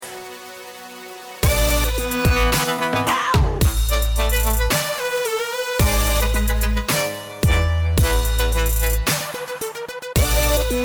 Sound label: drop